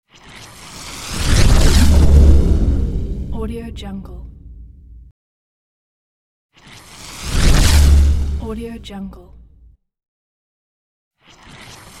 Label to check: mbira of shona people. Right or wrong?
Wrong